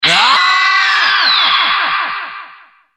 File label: BOMB; Milestone Shooting Collection 2; Wii